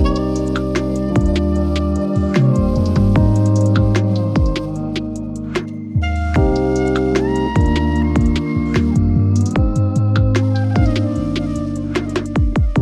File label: chill sax; BB 2HTC Samples Vol 4; Weird